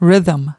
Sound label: rhythm